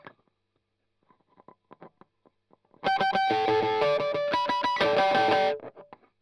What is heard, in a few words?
Strum Line